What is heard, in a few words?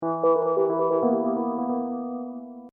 Hip Hop Dark Rhodes Licks 90 Bpm Swing B; Keyboard Loops; Lynne Music